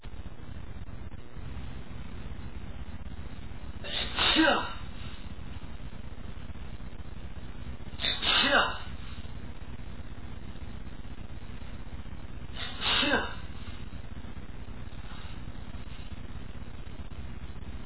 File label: pepper, male, achoo